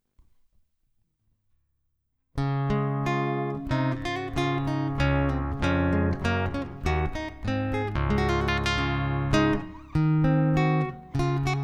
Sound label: minuet; classica